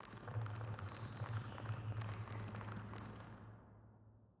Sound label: Forest Vines Recede; Forest SFX